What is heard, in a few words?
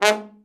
TROMB; Best Service Gigapack 1; BR TROM